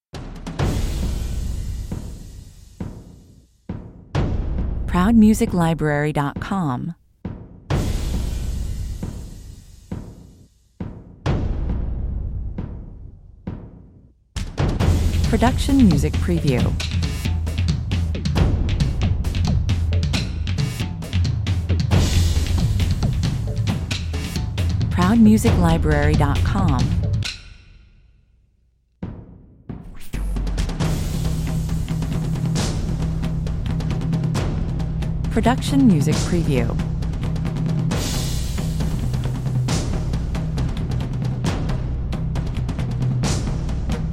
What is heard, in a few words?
percussion only